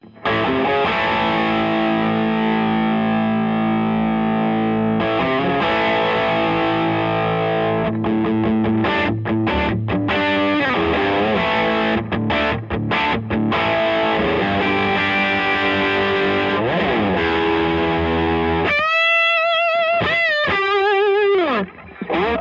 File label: Jensen BB40 ROCK HB; Jensen BB40 Audio Tracks